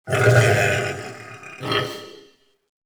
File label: wretch tunnels amb idle; A Machine for Pigs